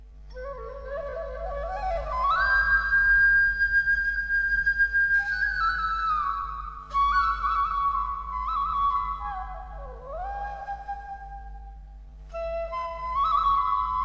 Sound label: Sad Bansuri Ringtone; ringtones